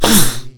NPC Hit

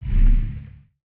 portal spawn enemy

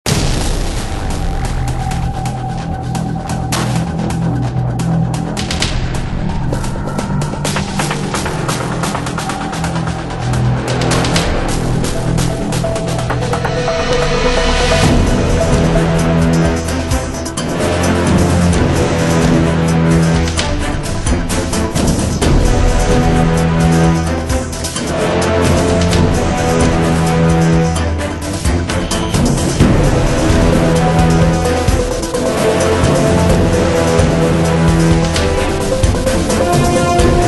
Music Boss (Otherworldly)

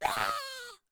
kobold flyer charge scream

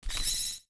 lightning bug hurt

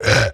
hit

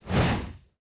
sonic boom blade slash

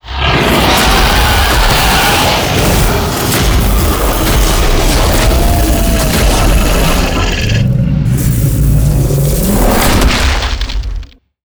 NPC Killed

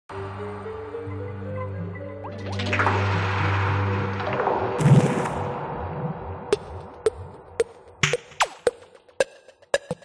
Music Ocean Night

